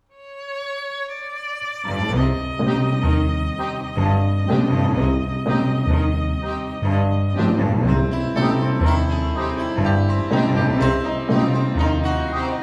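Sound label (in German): musik zum hörspiel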